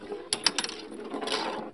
Pinball Ball Hit Rail Roll

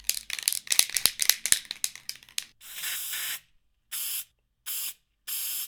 spray rattle; best service Blue Box; mixed sounds short

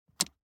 DICE on Neoprene, Grab, Standard, Three Dice; Samples; ArticulatedSounds; DICE Sfx Party (Extended)

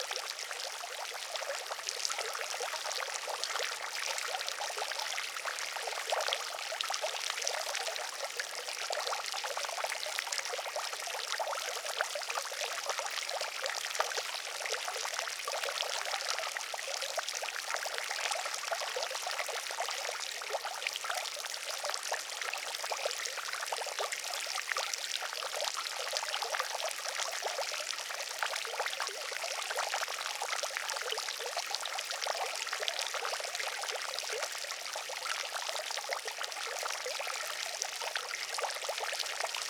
Fountain; DeadFright

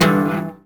Cello Hit String Snap; SFX Kit; Foley - Misc

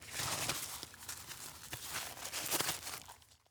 Sphygmomanometer, Medical; Blastwave

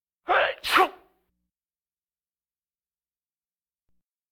Vocal effects breathing Moaning Baby

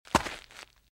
Footsteps - Gravel - Walk - Left; RadwinIsland

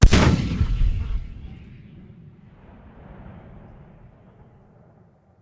Gun, Tank, Single Blast; gun and weapon sounds; captain audio; fully loaded

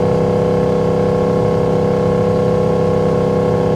Truck Durango Loop; Trucks

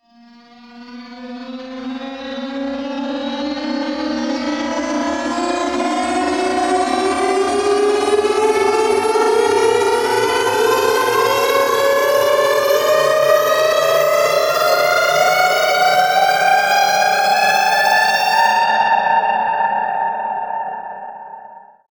Grainy Tention Long; RoosterFX

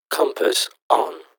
compass on; Pitch Black - A Detective's Demise

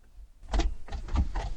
seatbelt unfasten; Apotheorasis

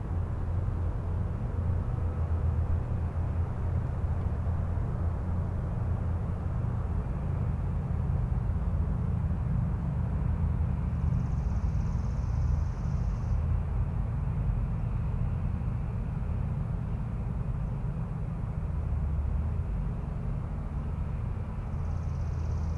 Suburban din day maintenance; boom library

